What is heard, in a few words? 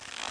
leath, punch